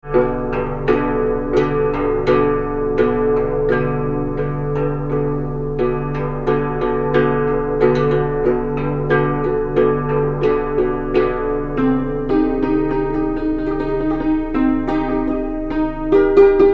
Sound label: chord